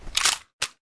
MK23 Clip Out, weapons; czero opforce

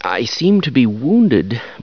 startle, hostage reactions; czero opforce

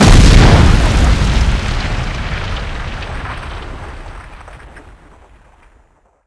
damage boom

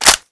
Mag Tap, F2000

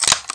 g36 bolt back

